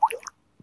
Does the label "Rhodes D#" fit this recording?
No